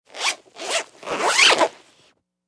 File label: Funny Triple Zipper; zippers